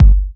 Bassdrum; Vengeance Samples

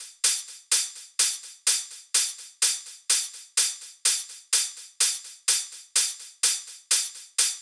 Kit 12, 126BPM; Purple Kit; Vengeance Samples

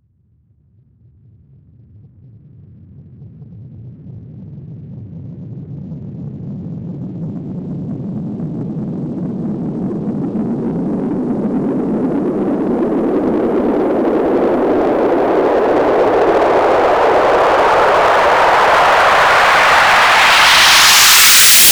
Sweeps Long

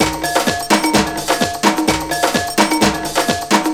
Percussive Loops 128BPM; Vengeance Samples

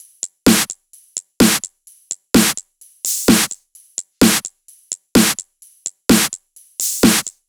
128BPM Big beat Kit; Vengeance Samples

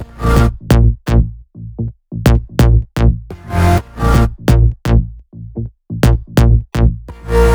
Infected Melody, 127BPM; Vengeance Samples

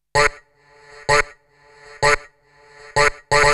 House Loops, Vocal Loops House 128BPM

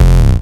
Offbeat Bass F; Vengeance Samples

